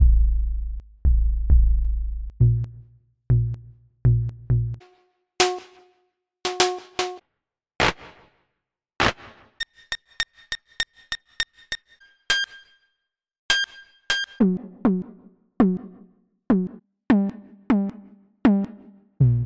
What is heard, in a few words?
synth drum; plugins